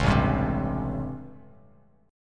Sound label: A 7th Sharp 9th, Bass Standard; A7#9; strummed; JGuitar